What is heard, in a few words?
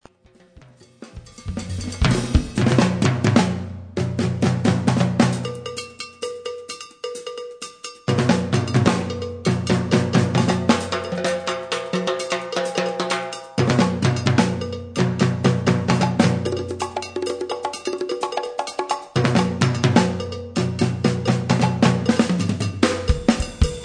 kalimba break